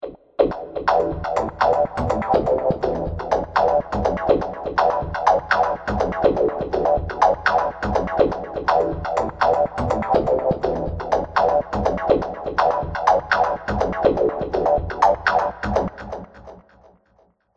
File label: 123bpm synth; nukearts